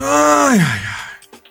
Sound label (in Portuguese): ah aiai cansado